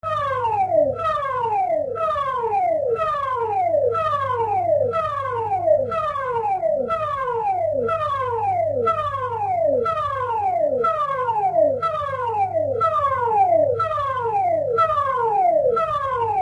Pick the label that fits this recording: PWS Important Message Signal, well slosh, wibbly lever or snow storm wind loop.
PWS Important Message Signal